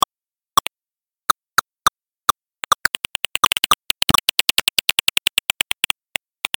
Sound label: Hoary bat followed by Little brown myotis